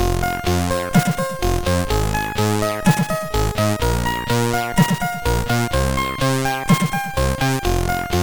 Kleines Echo, sid CSG8580R5

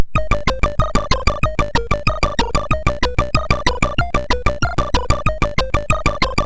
sid, MOS6581R2; Rocket Smash

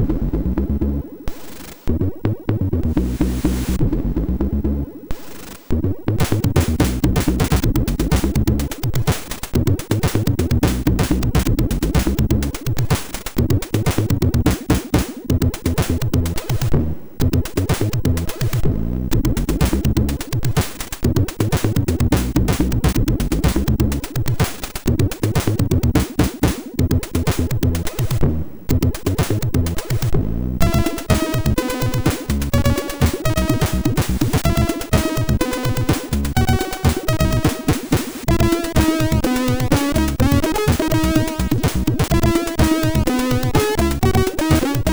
Funky sid MOS6581; hvsc

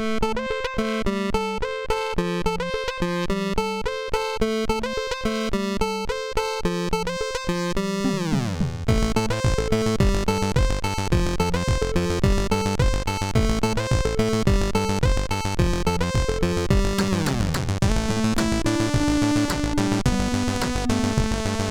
MOS 6581R3; All Rockets Flew Away

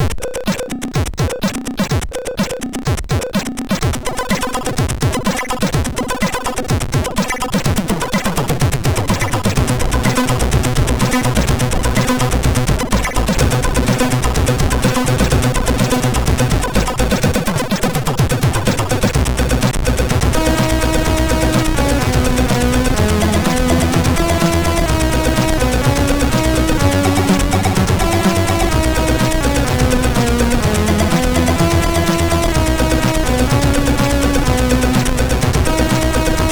sid MOS6581; MOS6581R3

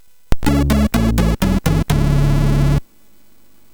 Game Music, MOS6581R4

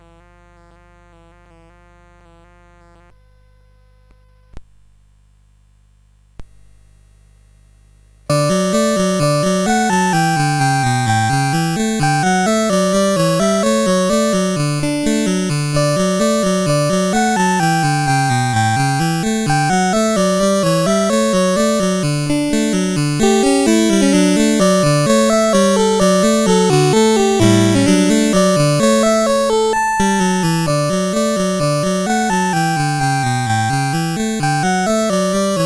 Adagio, CSG8580R5; Joseph A Grau; Les Petits Riens

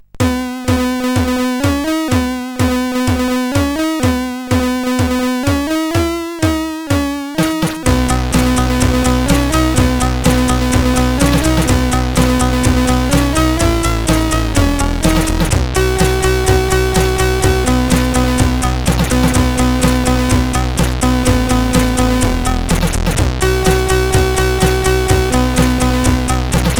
Scrollie Times tune, CSG8580R5; Sudden Death

